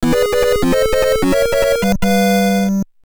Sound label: Super Mario Bros. Sound Effects Hurry Up; Super Mario History 1985-2010